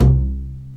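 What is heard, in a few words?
STR Cb Pizzicato, strings; Cb Pizz 3; Roland L-CD702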